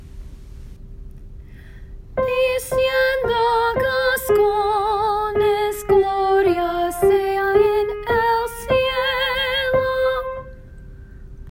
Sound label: solo